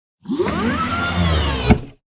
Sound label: Robotic Movement; SoundsCrate